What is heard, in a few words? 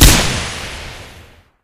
machinegun fire incendiary, machinegun m60; weapons; Left 4 Dead 2